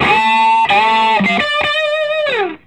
SOLO, Vintage Blues Guitar; USB Soundscan vol.22

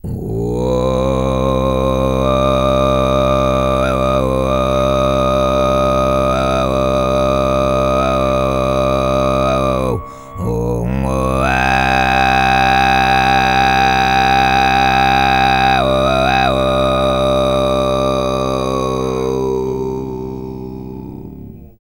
TV FREE, Vocal World Voices TUVAN LOW; ILIO; Vocal Planet VOL-5

